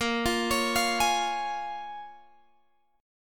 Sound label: Bb Minor 7th Bb, Guitar, Open G